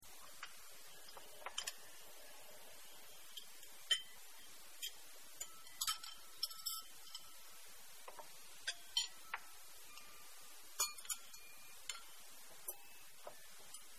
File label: customer eating loop; Delicious Emilys Wonder Wedding